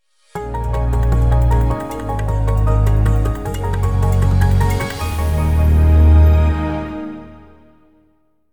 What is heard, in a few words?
KDE Startup